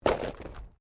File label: Body movement Slide Gravel